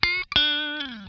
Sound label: LICK SCALE, Funk Guitar; Zero G - Funk Guitar